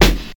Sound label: old school good hip hop steel snare drum sample f key